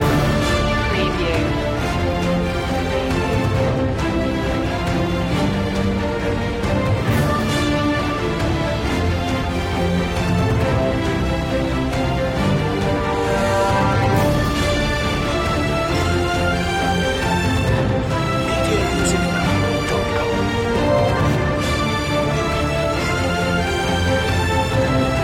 no choir

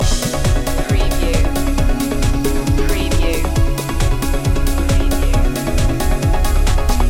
team leader loop

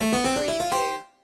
Casino Slot Machine Win Fanfare

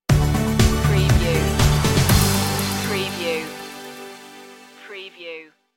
jetgirls stinger

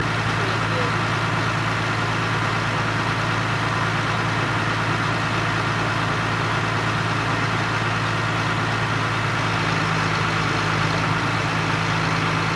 construction truck engine loop

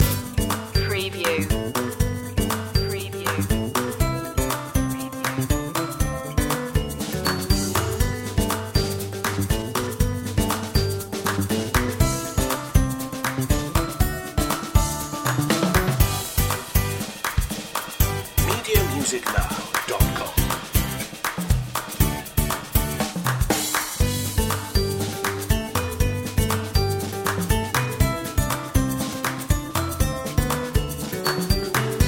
another way loop

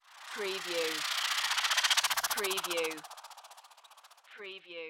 Scifi Production Element; Sentient Ectoplasm

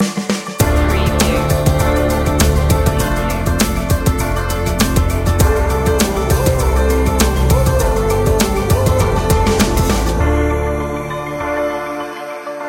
tribal trip hop

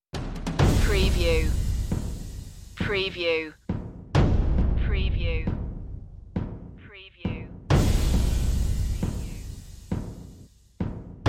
percussion

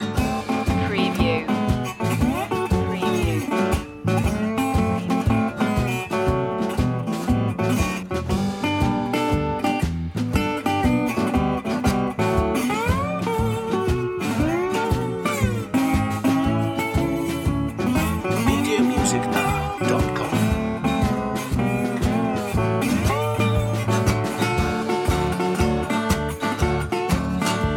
blues

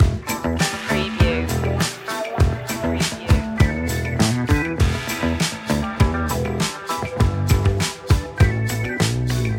feel the funk loop

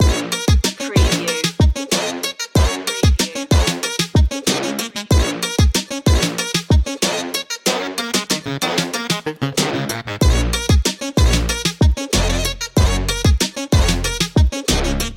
bouncy whip long looping

